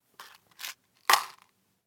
Bonbon Dose

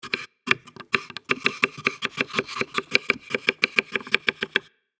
Biokartoffel schneiden

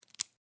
Schere schneidet

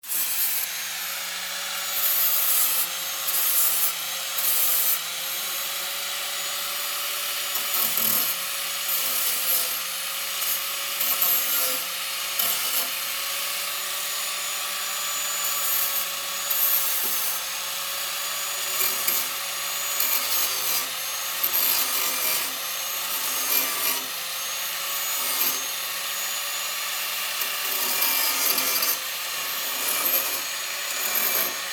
Autowerkstatt Flex

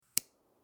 Sturmgasfeuerzeug an

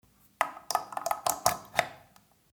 Stecker rein

